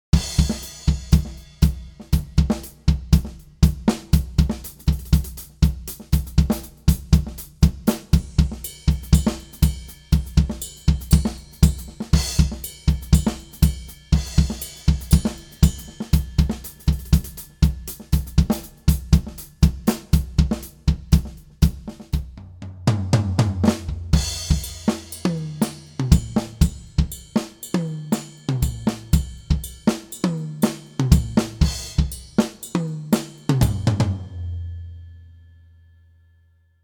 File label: Drums Hickory Sticks and Plastic Rutes on Ride Reverb